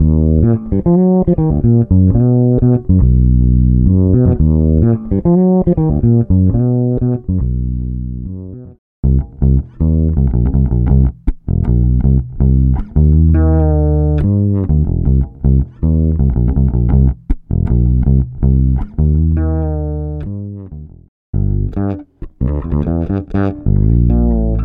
fretless bass smooth as silk

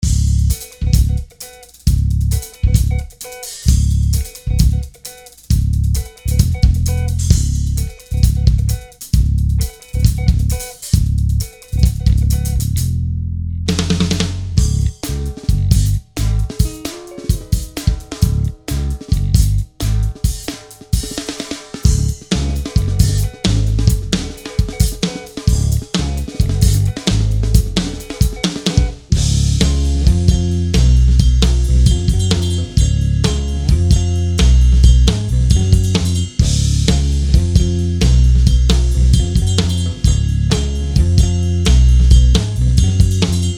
pure funk

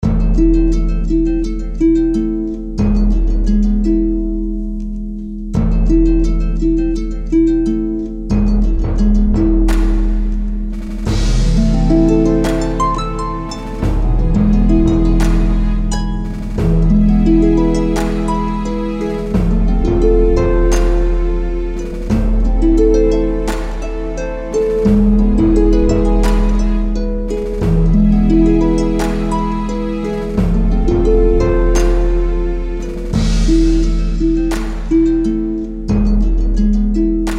orchestral harps

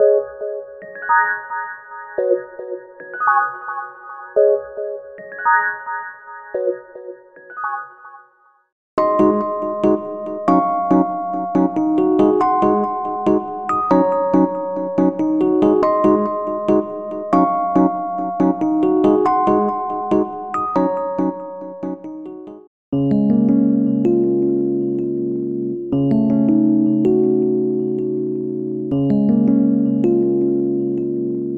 electric piano samples

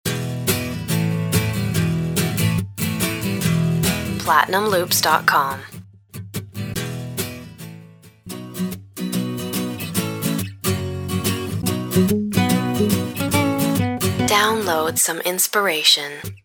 cheesy guitars voice duck

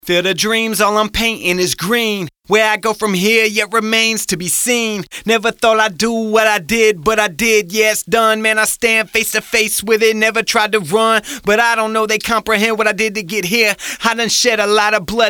Rap Vox wet acapella

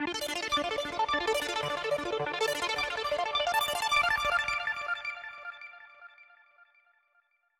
chord sequence audio

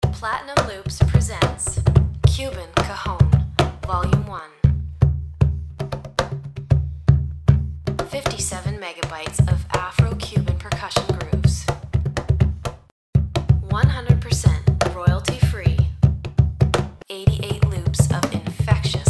cuban cajon

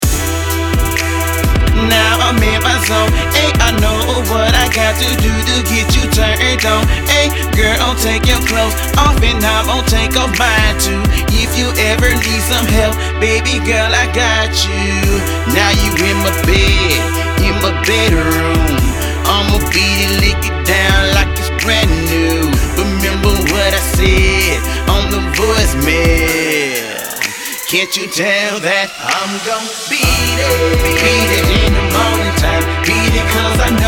stacked lead

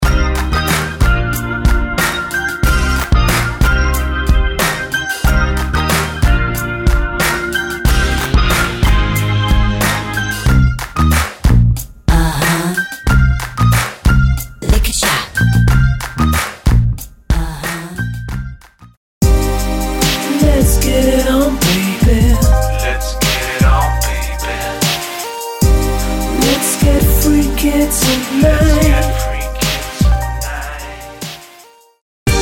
hip hop; producer pack

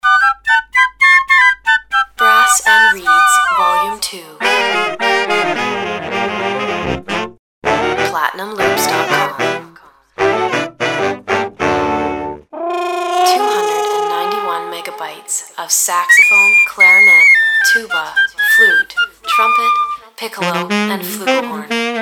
brass and reeds

